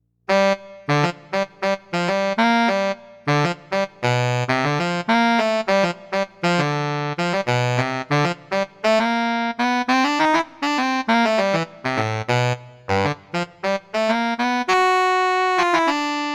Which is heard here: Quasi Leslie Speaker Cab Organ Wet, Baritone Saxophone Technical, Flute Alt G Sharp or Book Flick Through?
Baritone Saxophone Technical